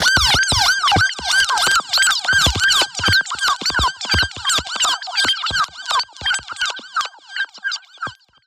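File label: alarm siren, modulation processed, 170bpm; musicradar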